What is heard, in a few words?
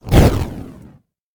Heat Burst; A Robot Named Fight